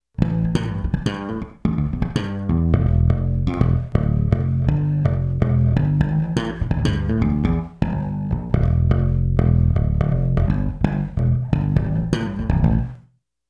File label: bass mix